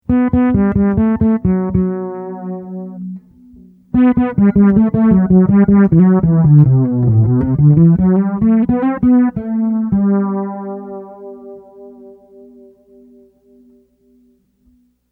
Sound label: chorus